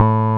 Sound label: Roxichord, E.Piano